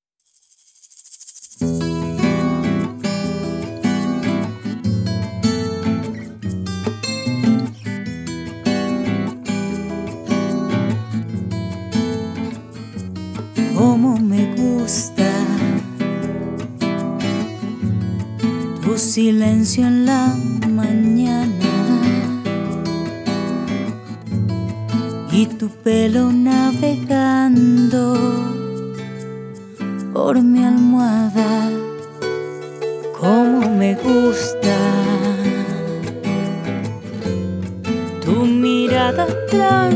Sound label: Cafe Latino